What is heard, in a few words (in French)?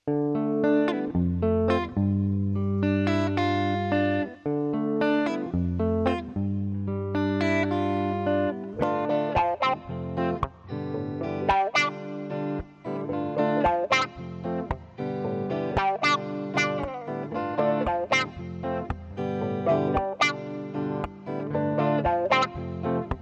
Guitare Wah